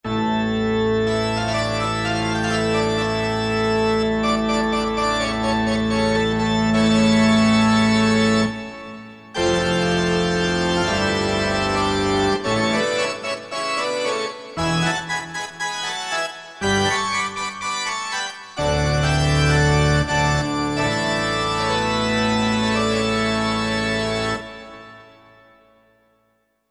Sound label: Full Pipe